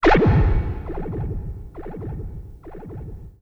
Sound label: SPACE FX 140; SpaceSounds